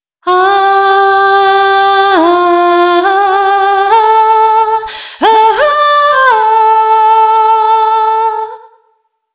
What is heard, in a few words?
Vocals; HoloToolkit-Examples; SpatialSound